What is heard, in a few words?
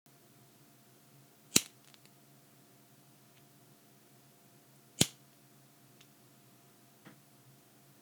lighter two times